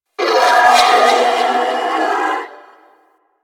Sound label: Sentient Attack, Barks; Enemies; Symbilyst